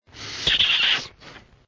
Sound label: Kiss